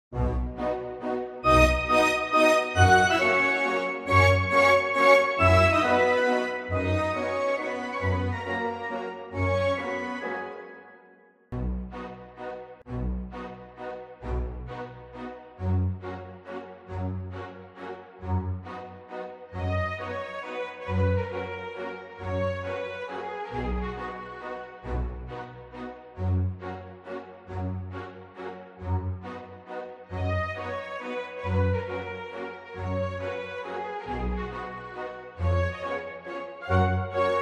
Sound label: KARAOKE La donna è mobile; Rigoletto (Verdi)